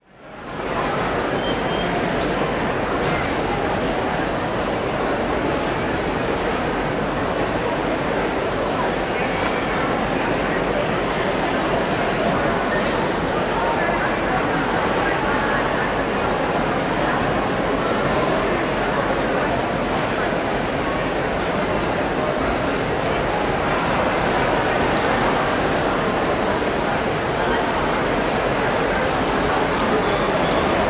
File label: Crowd Indoor Large Hall, Walla; Sound Ideas; Walla Series